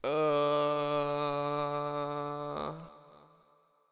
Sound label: ughhhh